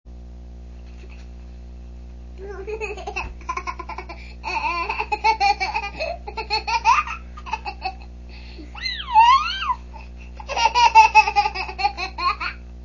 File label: giggle